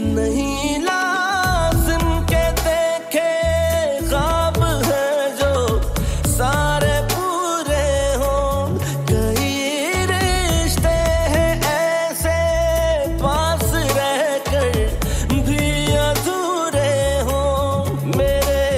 pakistani drama ringtone; bebasi; hum tv

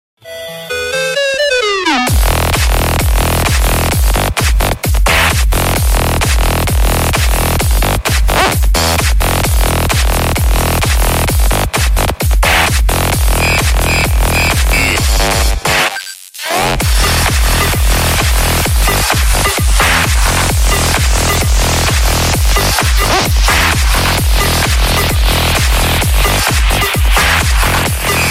trance ringtone; tetris

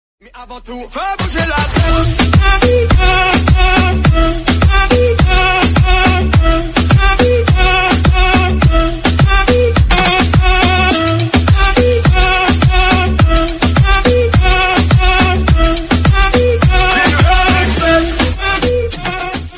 cool hip hop background music tone ringtone; galaxy ringtones

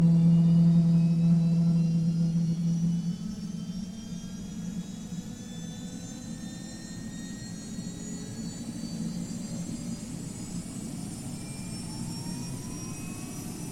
helicopter engine ringtone

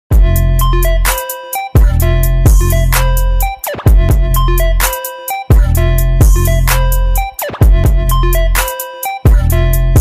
musical game musical tone ringtone

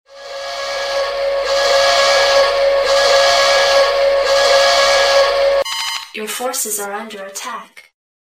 warning ringtone

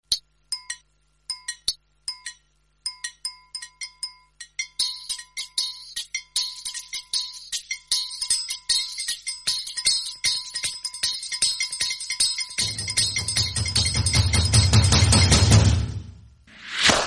unique background music tone ringtone

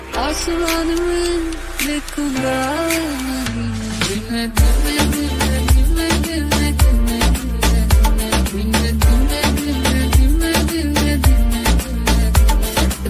sad ringtone; bollywood ringtones

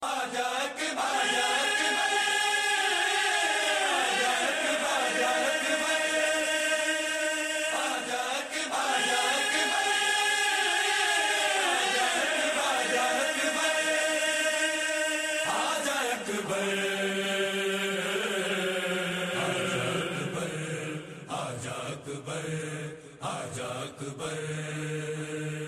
noha tone

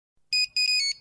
nokia tone

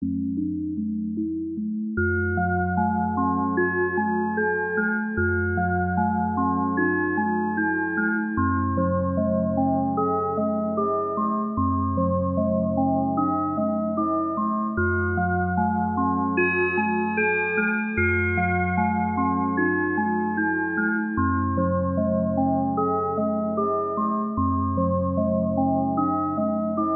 soothing cool ringtone

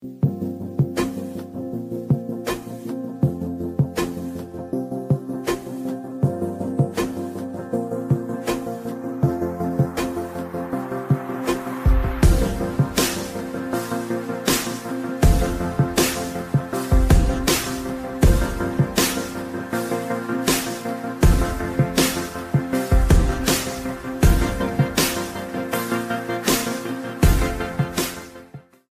morning iphone ringtone

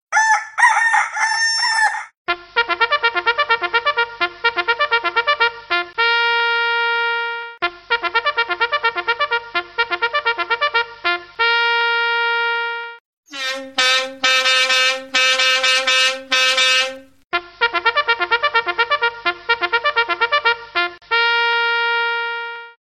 morning clock alert ringtone; nokia ringtones

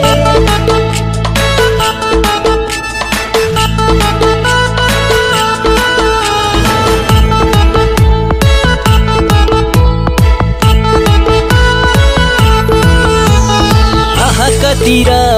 music ringtone

